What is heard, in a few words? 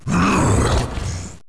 spawn shield